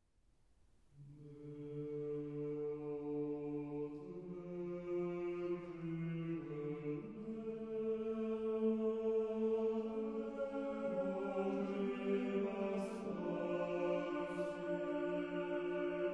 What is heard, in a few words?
Do Not Forsake Me concerto for choir; Berezovsky Maksym; Kyiv Chamber Choir